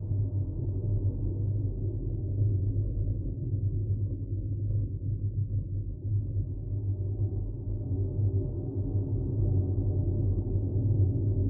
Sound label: Ambiance Dark